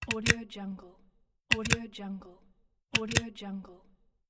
the cable plug

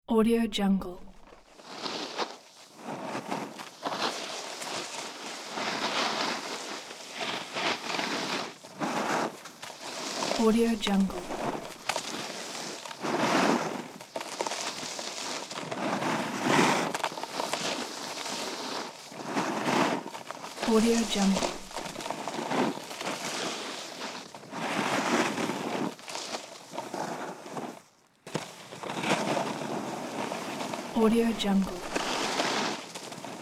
snowboarding; leksab

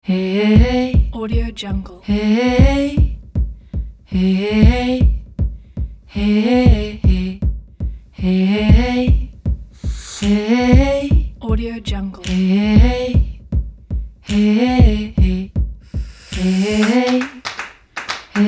upbeat catchy hey acapella

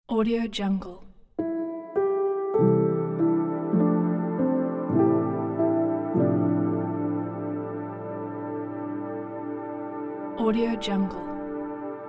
dramatic soundtrack; fbsantosomusic

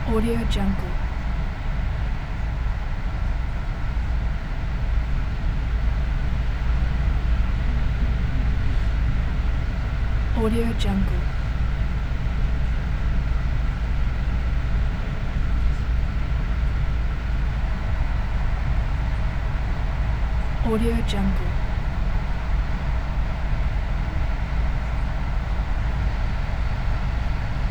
passenger train interior ambience

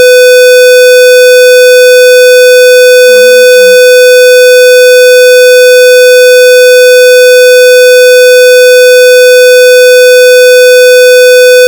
test tone square wave; prosoundfx

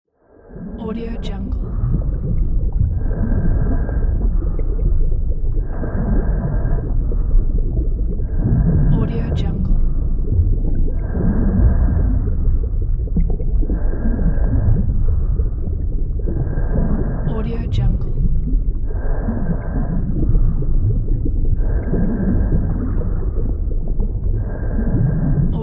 underwater

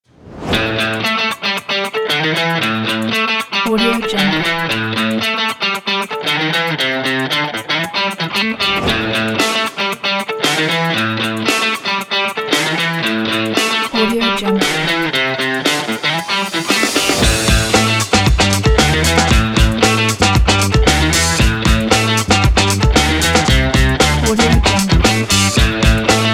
energetic funky

